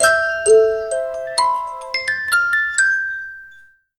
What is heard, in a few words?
a delicate close up piano; violin